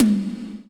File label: High Tom, Percussion; Giga Samples Collection; ELQ-DrumQit